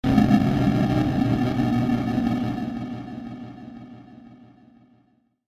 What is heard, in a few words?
Sci-fi Dynamite Decay; science fiction sound effect; star wars / trek style